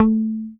HOFNER MUTE, Bass

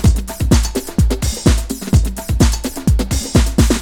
UK LOOP HARD, BREAKBEATS

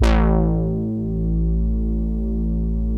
BIT BRASS, Vintage Keyboards

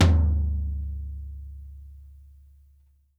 FLOOR; Sampleheads; New York City Drumworks VOL-1

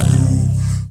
Dance Mega Synth; Best Service; 90 s Sample CDs

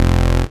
BAS, Eurotech; Sounds Good

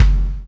CHAIN; Spectrasonics - Backbeat

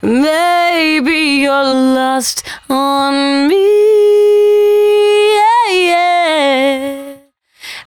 113bpm C#, Velvet Vocal; Zero-G

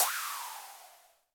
HiHat & Crash, Roland TD-20; HiHats

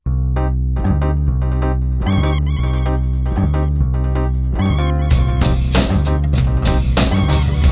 95BPM, Hip Hop; InVision Interactive; 40 Oz Phat Slammin Hip Hop

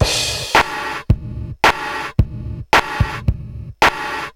80s Gated Verb Korg MR-16 (110BPM), cyborg beats; musicradar

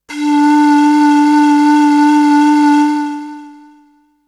Flute; ZERO G - Datafile DISC 3

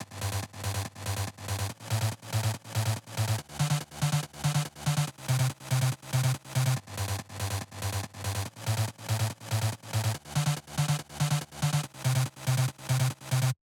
PWM Kit 142BPM Chorus - Sublead DRY, Gmin; Constr Kits; Melody & Synth; Vengeance; Essential Clubsounds Vol.5

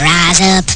rise up; Amiga Soundtracker Sample Packs

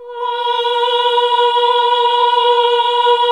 AAH B2, CLASSIC VOX5

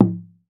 HOPI DRUM, American Natives; E-MU Formula 4000 Series Vol. 4; Earth Tones

